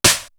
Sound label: RAPPER SNARE; East West; Global Sounds